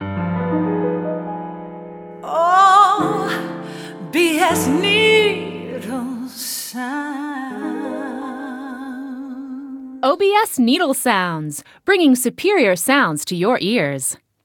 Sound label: Radio Jingle; let it die; OBS